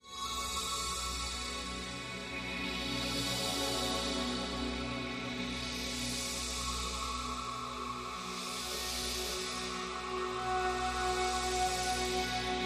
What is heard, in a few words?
Drone, Light